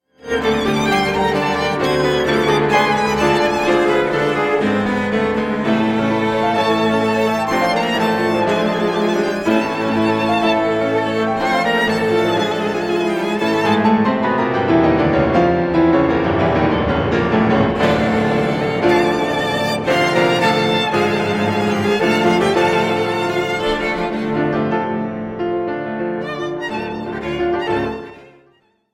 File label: quartet movement in a minor for piano and strings